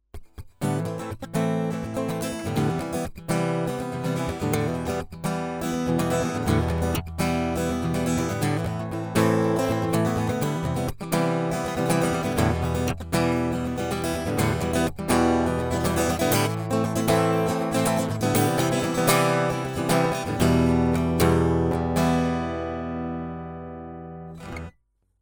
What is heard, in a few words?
Tech 21 QStrip Off A Git